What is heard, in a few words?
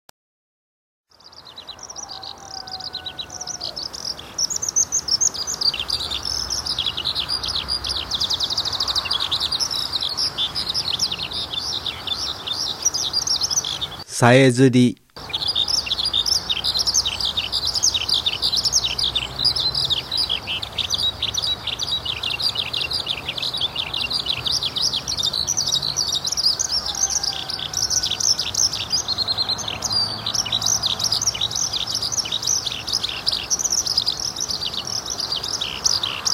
hibari, bird; azamidani